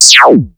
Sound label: Zap, Yamaha Motif ES; electronic & zap; Yamaha Motif ES-Series